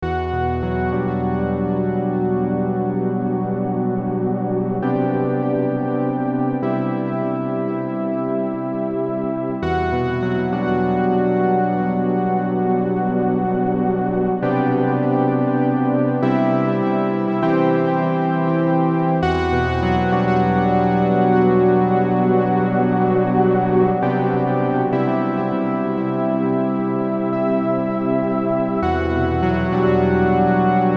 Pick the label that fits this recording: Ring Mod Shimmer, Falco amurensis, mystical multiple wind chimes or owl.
Ring Mod Shimmer